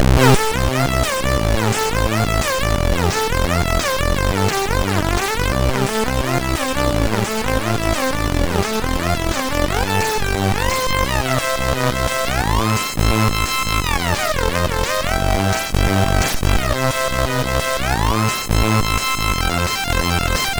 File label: Happy Dancing, MOS6581R2; Esprit